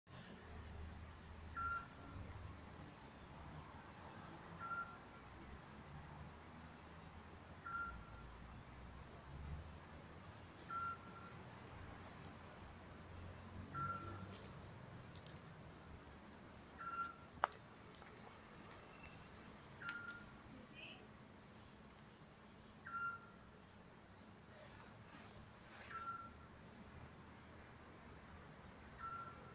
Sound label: scops owl call in the city